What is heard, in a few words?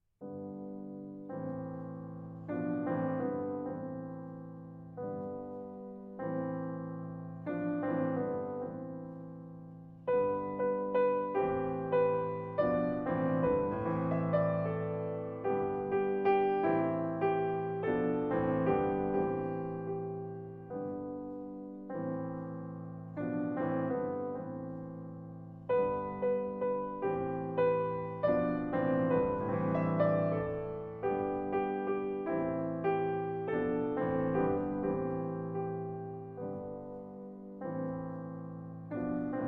Variations in G Major